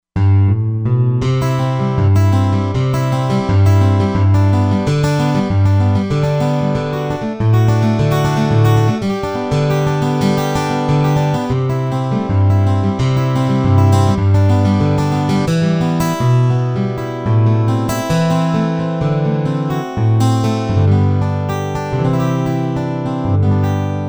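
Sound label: Guitar Six String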